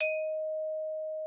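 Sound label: xylophone D#4; Xsample VOL-4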